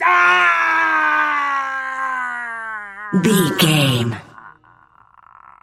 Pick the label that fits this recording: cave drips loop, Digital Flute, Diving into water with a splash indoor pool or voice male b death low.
voice male b death low